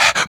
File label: Human Perc